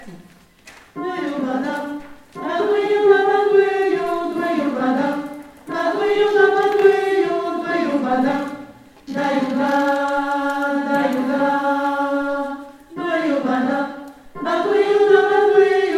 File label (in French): sopranos début, chorale